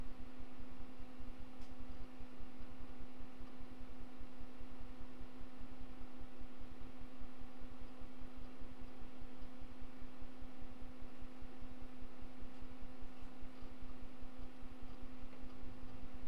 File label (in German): octane brummen